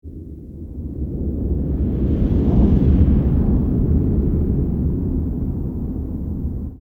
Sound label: flyby; TNG